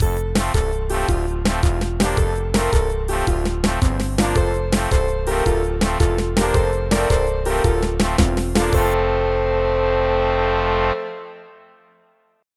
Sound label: Fun Level Jingle